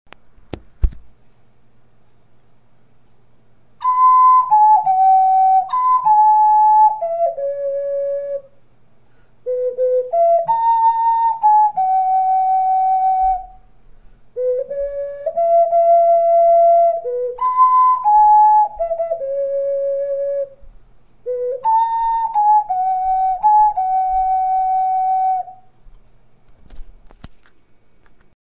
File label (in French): ocarina